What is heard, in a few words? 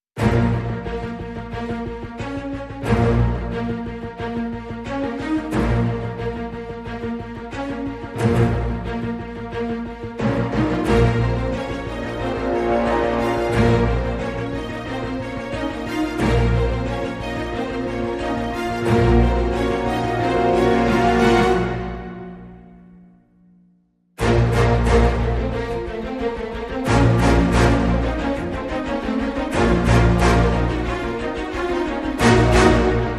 Orchestra Only